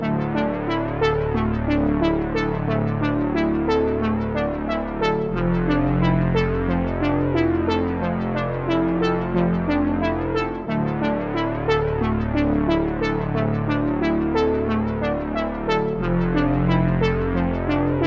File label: Music Loopable 90; Wise The Wise Path 2